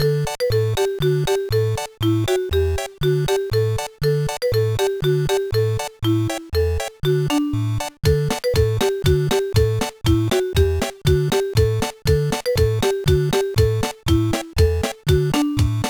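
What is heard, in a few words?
background music; No No No Puzzle Chailien; GBA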